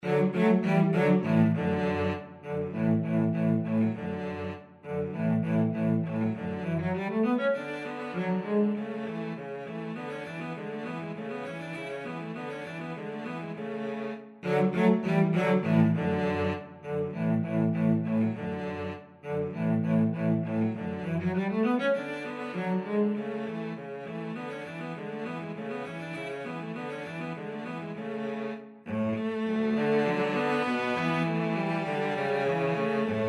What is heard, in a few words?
VLC DUET, cello duet